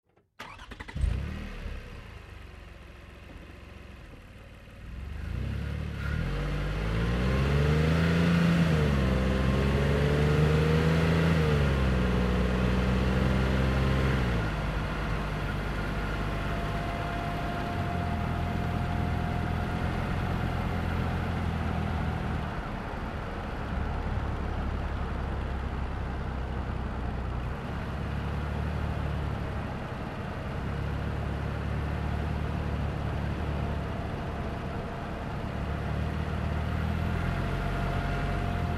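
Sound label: Car Highlander Onboard Drive Med; 2005 Toyota Highlander V6; Vehicles; Car Highlander Ob Drive Med; Cars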